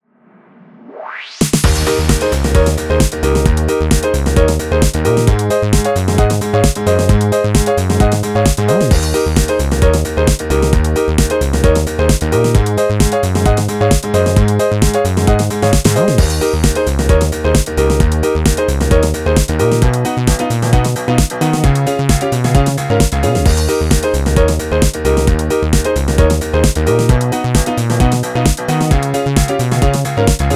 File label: karaoke